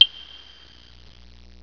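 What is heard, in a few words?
BLOCK, PERCUSSION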